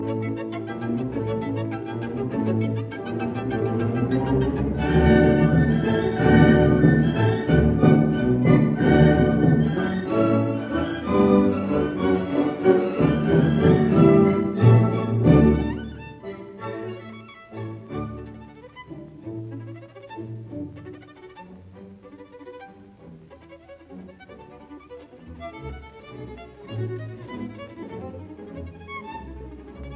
Violin Concerto in D major, Allegro giocoso ma non troppo vivace; Brahms; Op 77; Poco piu presto